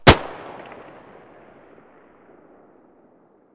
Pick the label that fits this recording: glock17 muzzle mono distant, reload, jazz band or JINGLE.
glock17 muzzle mono distant